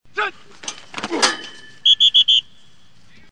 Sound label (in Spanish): Futbol Americano